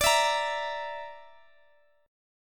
D Suspended 2nd Sharp 5th D, Guitar, Open D

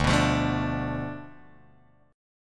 D Minor 6th Guitar Drop D

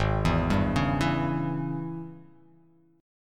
Ab Major 7th Suspended 4th Sharp 5th; arpeggiated; AbM7sus4#5; 4,7,5,6,4,x,x,x; JGuitar

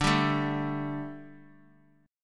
D Suspended 4th D; strummed

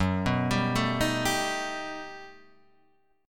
G Augmented Major 9th

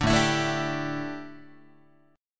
A 7th Suspended 4th down Guitar, chord; A7sus4 strummed

